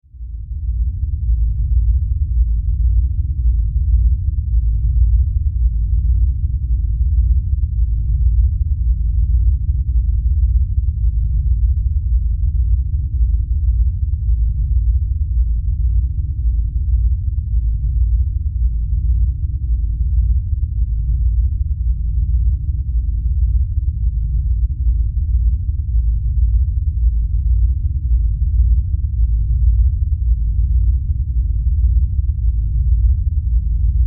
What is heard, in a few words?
Science Fiction Mother Ship Drone Rumble; Spacecraft Sound Effects